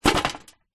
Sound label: household bucket metal old rusty set down gently concrete; zapsplat